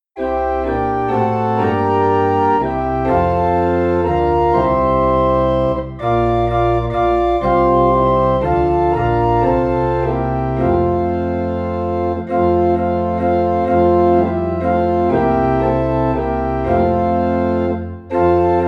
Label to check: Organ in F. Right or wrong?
Right